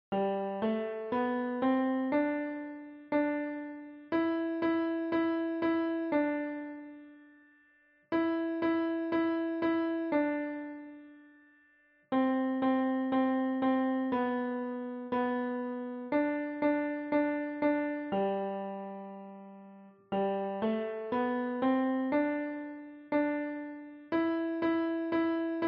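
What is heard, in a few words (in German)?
Klavier; Alle meine Entchen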